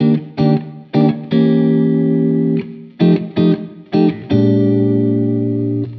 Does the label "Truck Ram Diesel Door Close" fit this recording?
No